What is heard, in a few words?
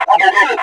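hover search; baseq2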